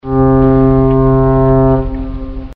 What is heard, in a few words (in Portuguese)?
Buzina de Navio, som de buzina de navio